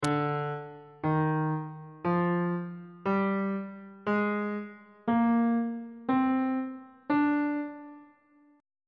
d locrian mode note positions

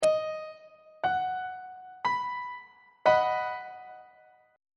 b major 1st inversion on treble clef

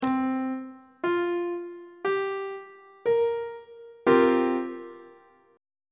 d double flat dominant 7th suspended 4th chord; treble clef

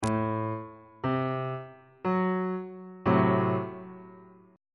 f sharp diminished 1st inversion on bass clef

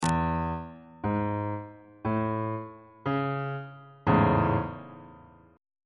a major 7th 2nd inversion

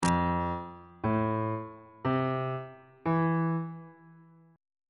g dorian 7th chord 7 is f major 7th on bass clef

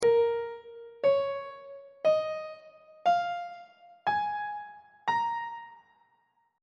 a sharp minor pentatonic scale; on treble clef

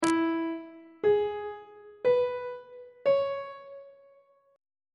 f flat major 6th chord intervals; on treble clef

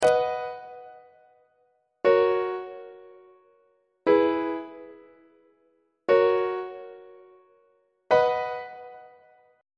b major perfect authentic cadence on treble clef

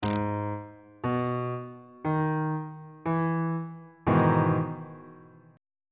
e major 7th 1st inversion on bass clef

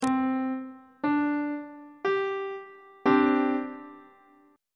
c suspended 2nd chord on treble clef

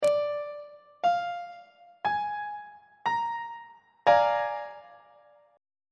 a sharp dominant 7th 1st inversion on treble clef

